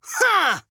Grunt Attack; Paladins; Demon

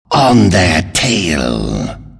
attack; Slardar; Dota 2

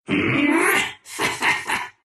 laugh ru; Rubick; dota2

